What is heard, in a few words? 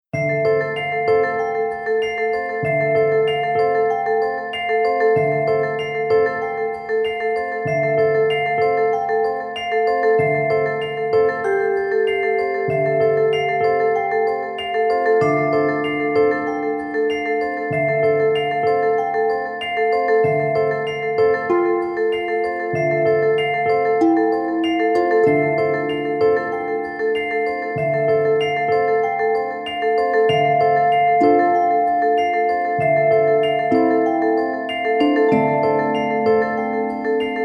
Tuned Tinkering; Noisebiscuits